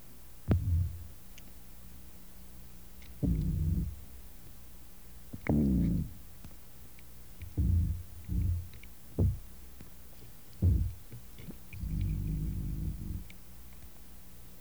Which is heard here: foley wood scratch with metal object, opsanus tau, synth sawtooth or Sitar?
opsanus tau